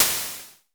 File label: SN Basic, Vermona Mono15 Drums Snare